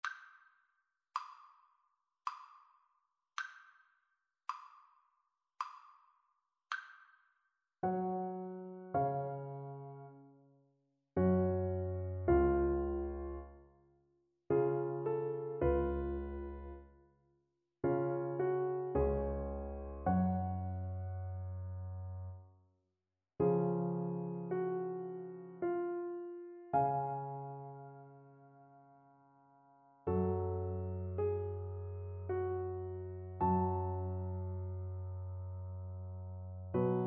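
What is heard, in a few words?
viola karaoke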